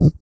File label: Vocal, Blues & Soul; CUICA MAN